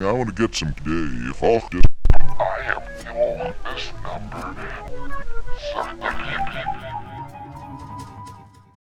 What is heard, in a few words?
Fx Vox; Equipped Music; Loungin House